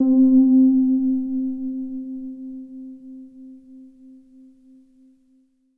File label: Prophet 5 KEYS Relax Low - C; One Shots; Cymatics; Fantasy Synth Sample Pack